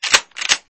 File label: Reload; EasyTouchBundle; EasyTouchControls